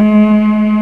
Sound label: CELLO, MELLOTRON; East West - Global Sounds